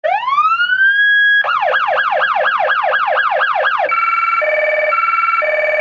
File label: triple siren